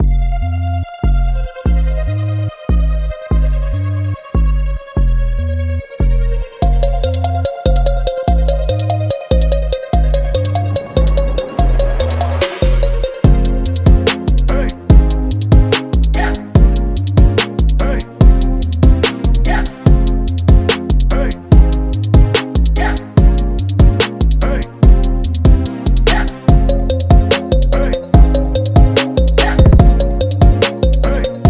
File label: Instrumental